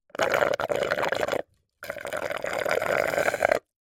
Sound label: human drink slurp soda dregs ice through straw fast food